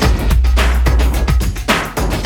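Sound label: BASSOON LOOP, BREAKBEATS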